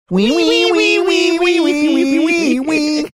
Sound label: singing portion violin